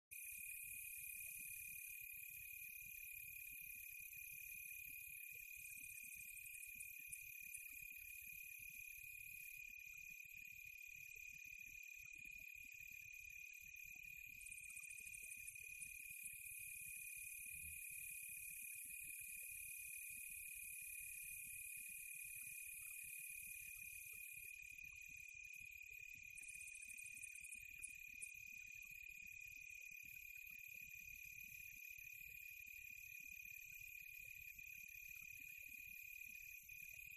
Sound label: ATMO NATURE Quiet Tropical Night Close Crickets; smartsound